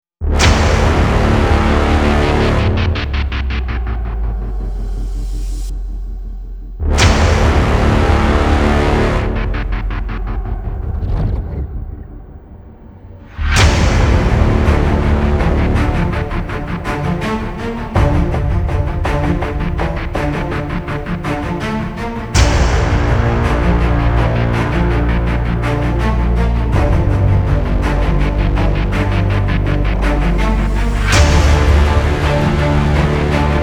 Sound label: epic, cinematic, dark